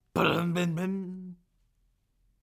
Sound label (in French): chaise métal tombée; Mobilier; mouth-fishing